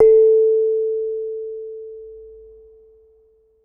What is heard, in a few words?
Kenong, Gamelan